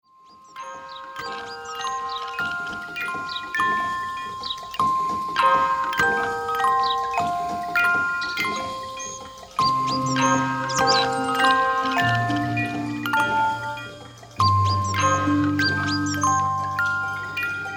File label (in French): Reveil